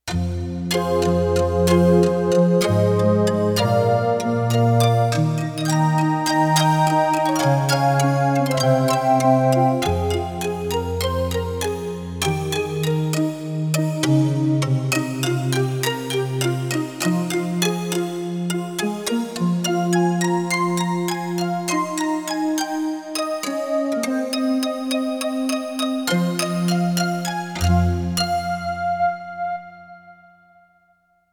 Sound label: Pluck the Pad, Roland VC-1